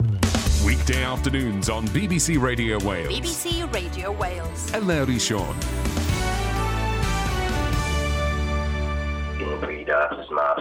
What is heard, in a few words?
live radio beatboxing; beatboxing demo